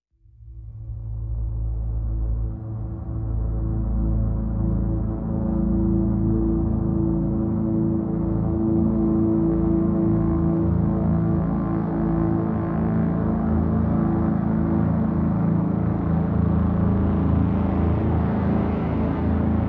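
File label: Drone Fahrenheit A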